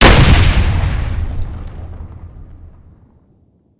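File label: grenade explosion close; Escape from Tarkov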